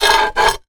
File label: glass thick large piece sharp edge scrape on other; zapsplat